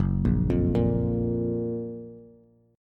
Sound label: F sharp Major 7th, Bass; arpeggiated; JGuitar